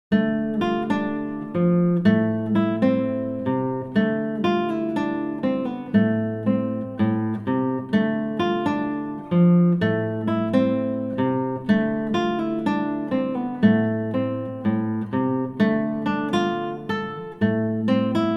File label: Piece in Cuban Style